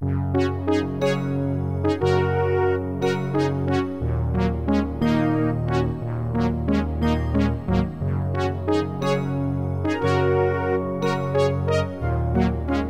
Fanfare of Courage, DOS; Ultima 7

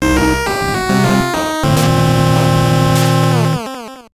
Get Ready to Roll, NES; Monster Truck Rally

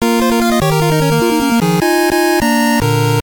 Bomb the Door, NES; Code Name Viper

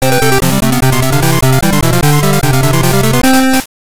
Miss, GB; Fish Dude

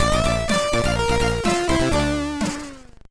C64 Game Over; Mechanicus

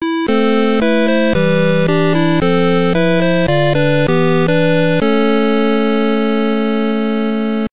Game Over, NES; Gun.Smoke

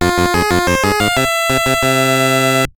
Course Clear; Mach Rider; NES

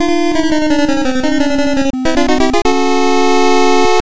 Game Over, NES; Double Dragon

